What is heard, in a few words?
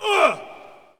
Hockey Male Hurt; SFX Kit; Hockey Vocal Sounds